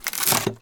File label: wooden tearing; fvtt sonniss gdc audio